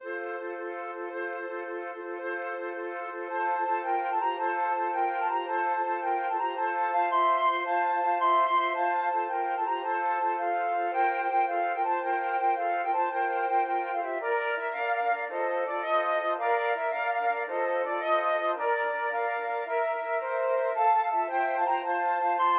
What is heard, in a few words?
flute quartet